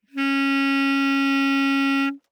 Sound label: Bass Clarinet ff Db4 stereo; Woodwinds